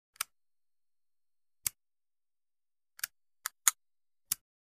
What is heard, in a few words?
.45 Colt: Safety Movement; Series Of Engaging And Disengaging Colt Automatic Pistol Safety And Slide Lock. Close Up Perspective. Guns.; foley gun movement